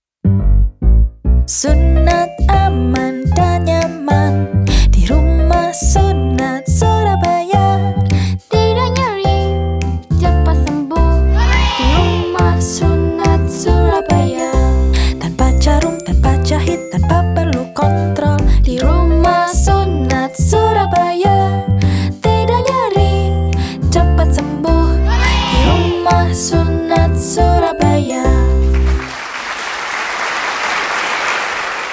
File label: Jingle; RUMAH SUNAT